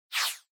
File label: Motion Agility High